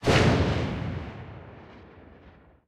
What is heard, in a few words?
mg81 real last shot right, guns